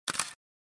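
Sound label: shutter 5D Mark IV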